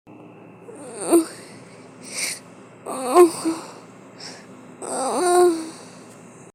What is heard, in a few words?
hhhhhh